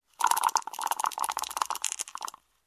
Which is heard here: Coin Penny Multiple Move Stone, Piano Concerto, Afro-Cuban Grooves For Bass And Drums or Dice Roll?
Dice Roll